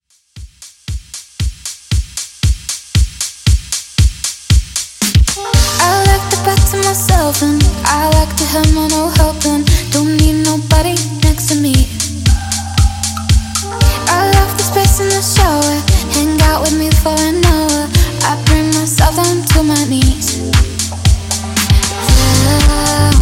Top40 Redrum 116 Bpm

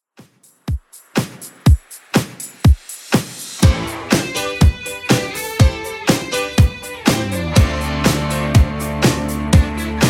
80s Redrum 122 bpm

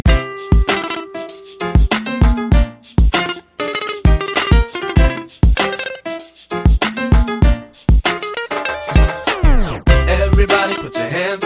Moombahton RB Hype No Hype 104 Bpm

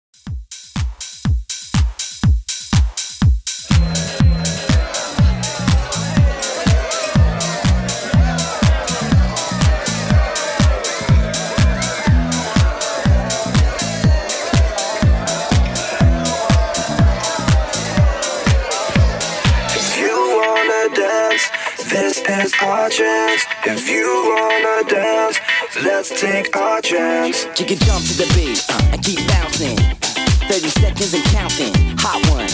Redrum 122Bpm

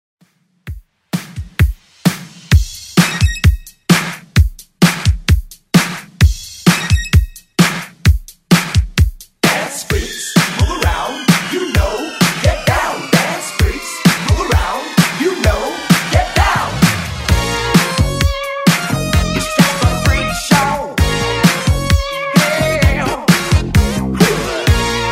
80s Redrum, 130 bpm

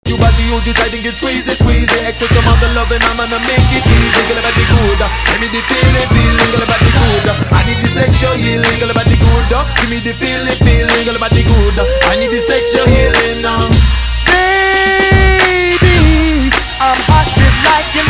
Classic Club Party MashUp

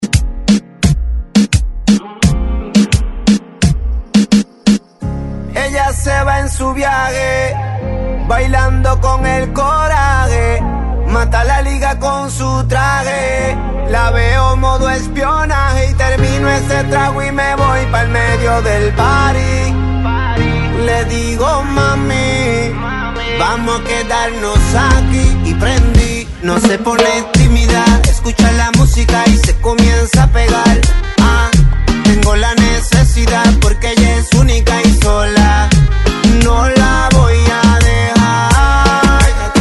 Reggaeton 3A 86 Bpm; DJDOLLS

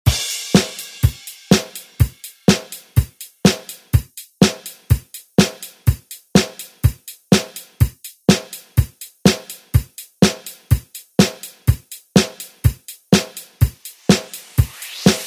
90s ReDrum; remixplanet

